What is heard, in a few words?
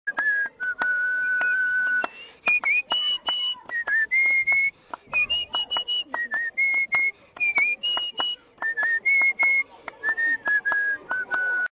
huayno; silvido